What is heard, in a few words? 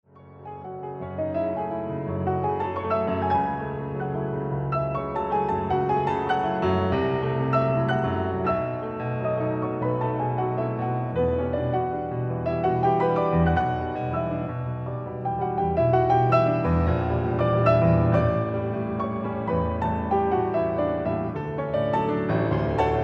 Piano; Scriabin